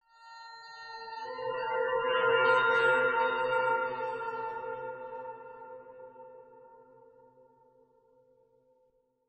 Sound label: Metallic Horror Stinger